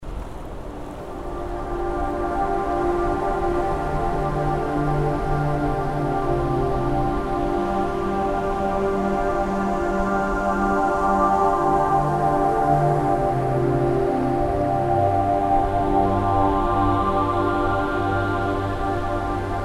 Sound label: Magic Ocean; Fantasy Music